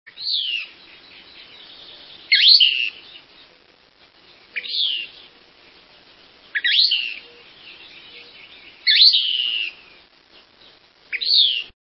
Thick billed Kingbird, songs

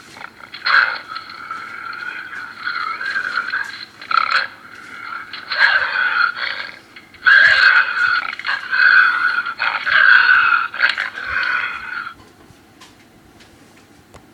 Bobcat

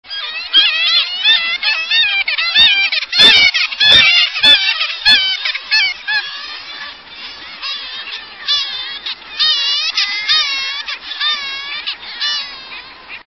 Black legged Kittiwake

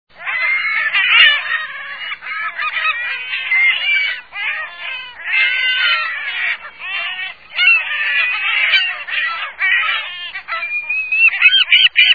California Gull

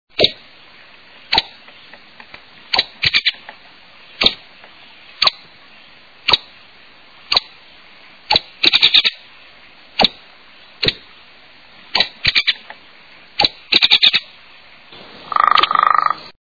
Hairy Woodpecker

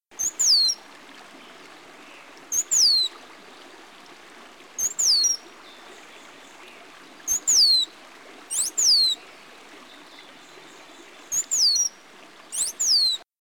Rose throated Becard

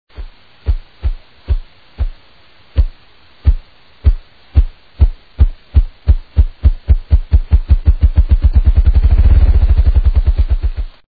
Ruffed Grouse, songs

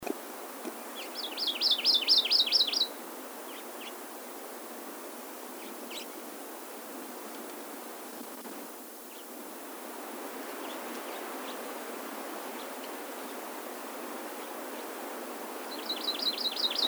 Hawaii Amakihi

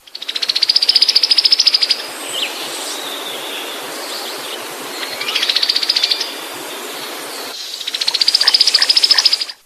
Shiny Cowbird

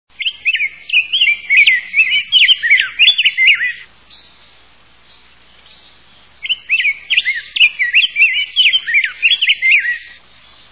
Rose breasted Grosbeak, songs

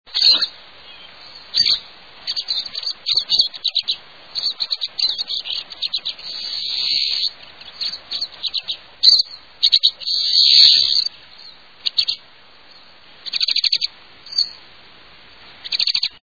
Pine Siskin

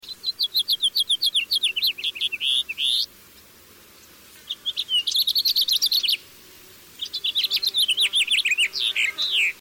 House Finch

